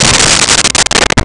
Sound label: Orch Hit C4; Nintendo; July 2020 Gigaleak